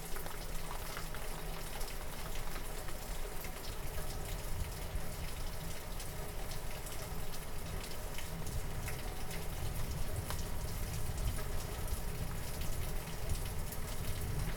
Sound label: rain, raining over blinds, inside recording; ambience; NS Studios - ultra sound collection